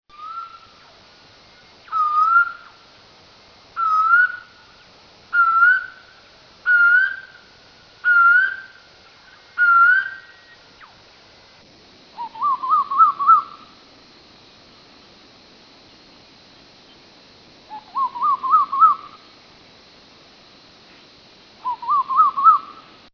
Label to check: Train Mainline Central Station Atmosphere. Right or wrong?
Wrong